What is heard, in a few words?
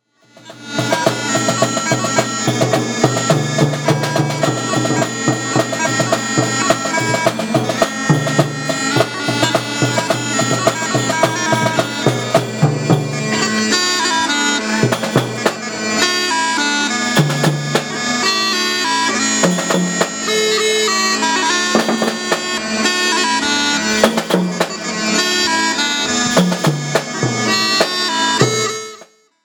Jingle Bells